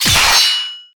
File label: sword kill